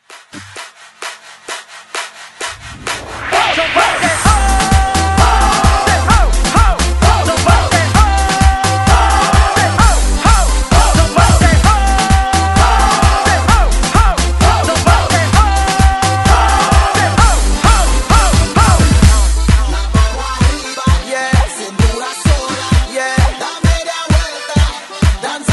Clean Intro Hype 130 Bpm

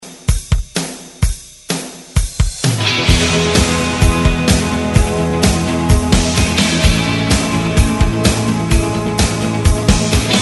90s Rock ReDrum 129 Bpm; DJDOLLS

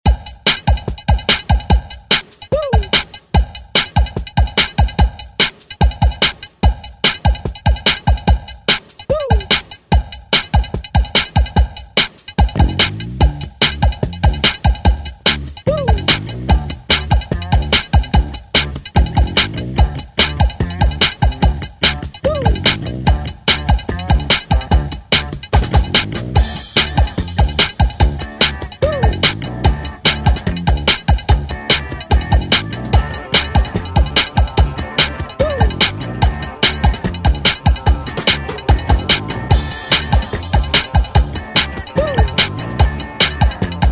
70s Ext Redrum

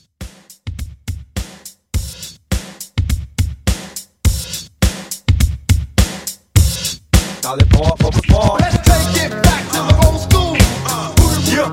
70s Hype Re Drum 104

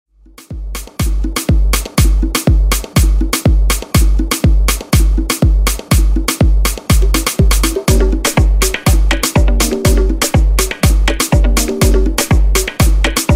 Tribal House

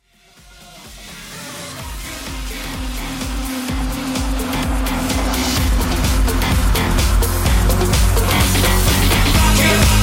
127Bpm

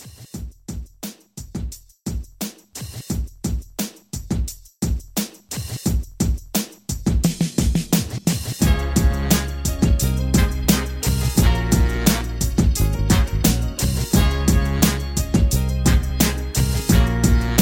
70s RnB Soul Re Drum 87

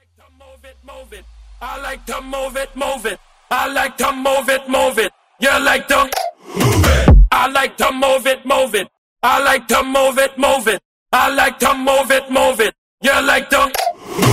Acapella Intro Edit

